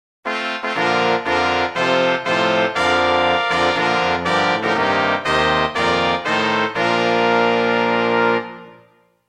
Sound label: fanfale